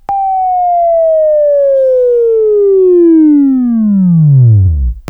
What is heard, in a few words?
Buzz, Left; Labview-Program; Sweeping Training program